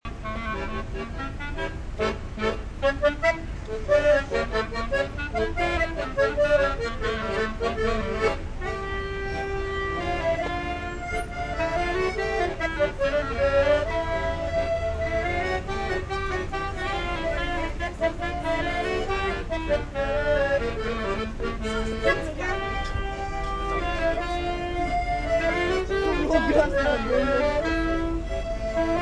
street musicians in quebec playing the accordion and clarinet